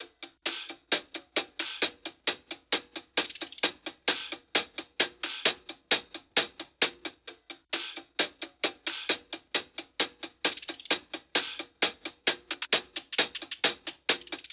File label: loop hat 132; Seismic Loops